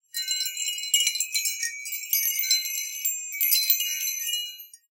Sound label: Wind Chimes B